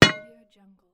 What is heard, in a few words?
metal rod hard impact